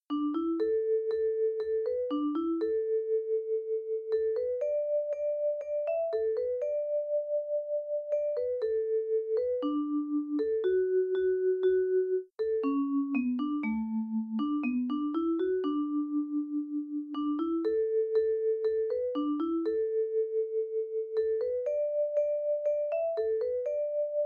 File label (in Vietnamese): nhac thieu nhi midi